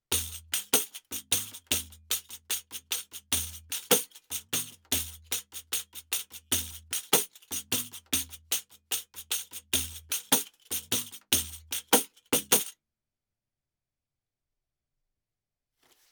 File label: Pandeiro High; Yasu Mix